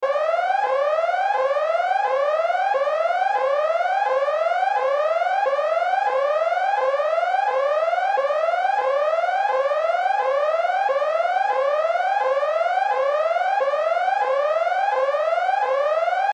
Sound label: alarm tone